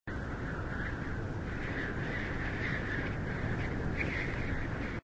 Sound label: storm loop; penguin dinner